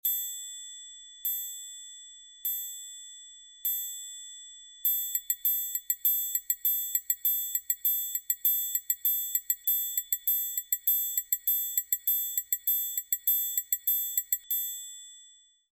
triangulo

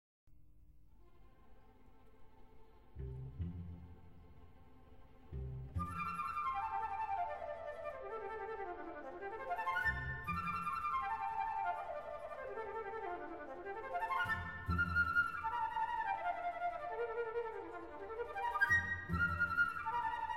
flauta

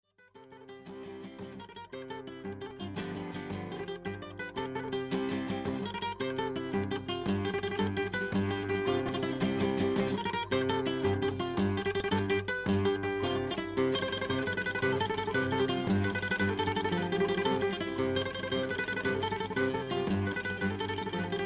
bandurria

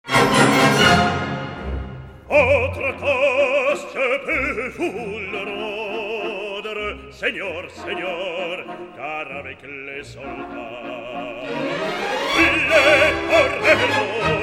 Baritono

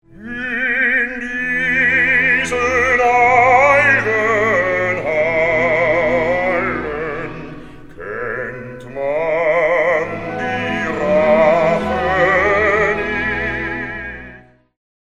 BAJO

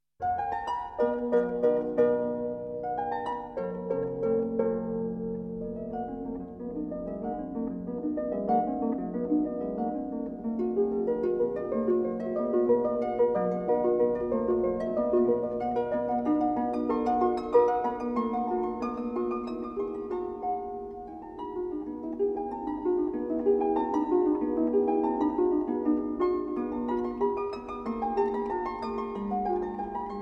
Arpa

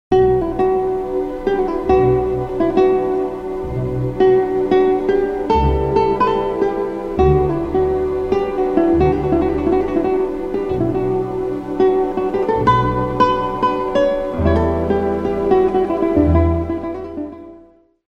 guitarra

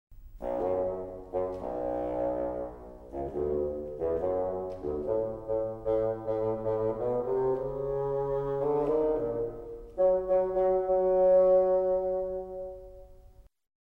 fagot

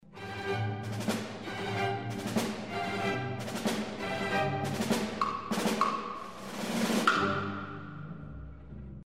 caja china

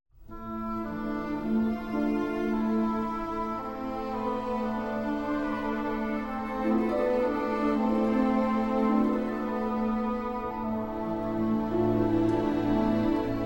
violín

